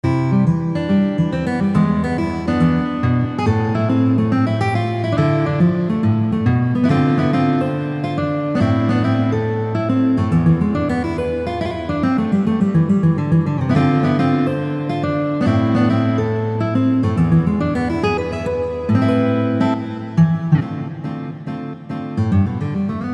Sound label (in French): Picking décalé